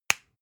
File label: spice jar smack close